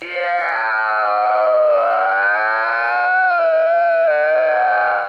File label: arghh sms